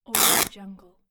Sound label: cloth rip